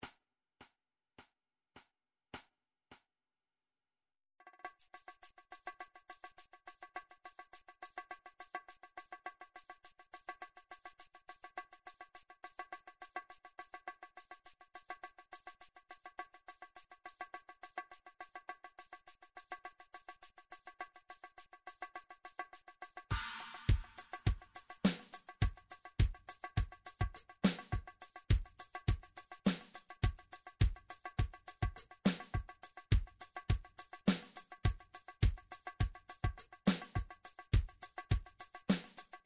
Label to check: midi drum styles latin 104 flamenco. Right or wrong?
Right